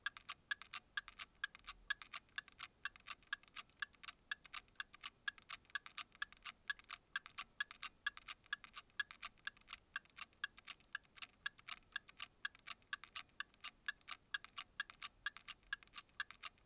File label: Mini Clock ticking